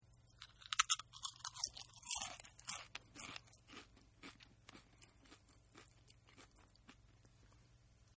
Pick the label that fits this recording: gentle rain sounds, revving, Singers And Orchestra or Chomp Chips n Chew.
Chomp Chips n Chew